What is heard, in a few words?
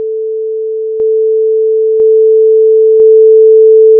synth test